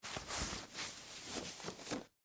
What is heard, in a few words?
Track Pants Take Off, Foley, Clothes, Track, Pants, Take, Off; Dressing; BlastWave